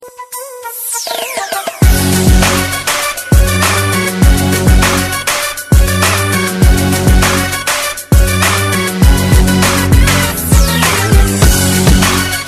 Hip Hop Beat

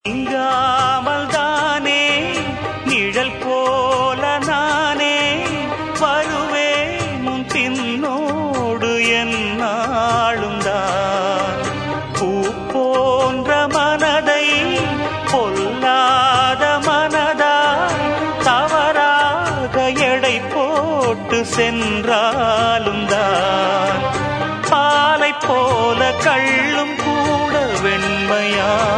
love sad

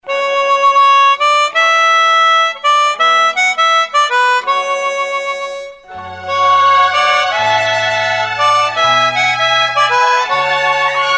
Awesome Mouth Organ

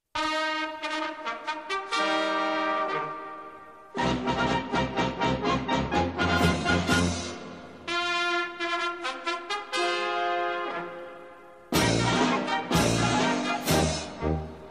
Colombian Anthem

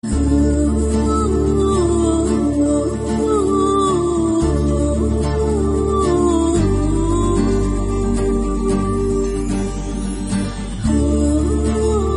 Humming

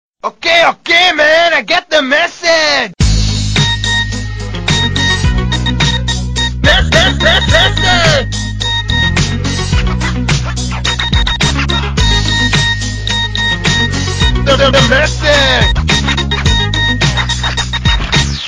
Nokia Tone

